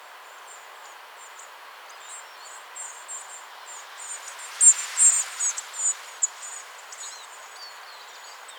gros bec casse noyaux

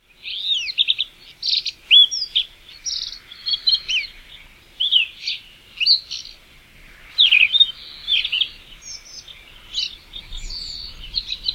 monticole merle de roche, orn; Chant gazouillis flûté : {AxTG} $; Cri : quasi-identique au chant

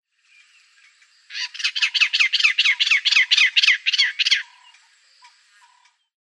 coucou geai

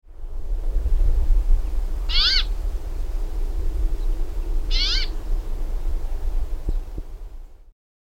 pinson du nord

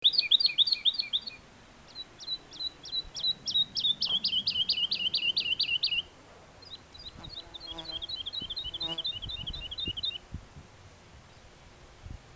alouette lulu

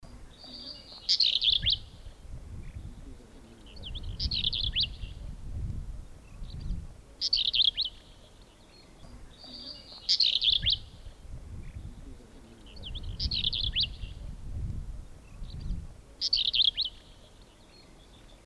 traquet oreillard

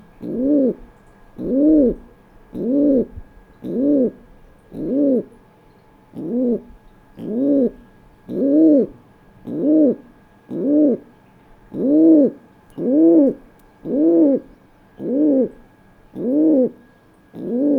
pigeon biset; {G1} rouhhh; roucoulé; { Registre Grave Phrase à 1 Syllabe } Syllabe prolongée "rouhhh"

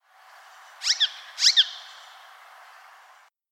hypolais icterine

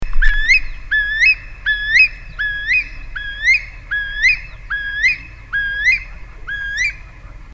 courlis cendre

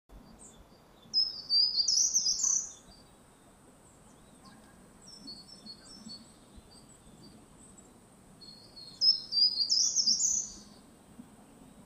grimpereau des jardins

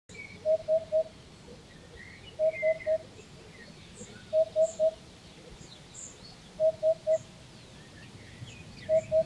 huppe fasciee; Syllabe "oup"; chant; Syllabe "oup" frontière inter-Sons "." Syllabe "oup" frontière inter-Sons "." Syllabe "oup"

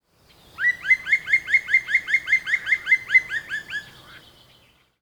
pic noir

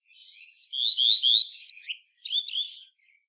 rougequeue a front blanc

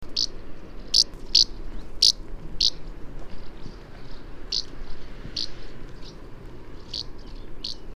hirondelle de fenetre

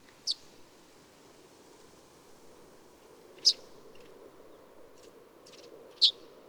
bruant jaune

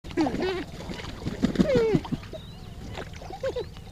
orn spatule blanche

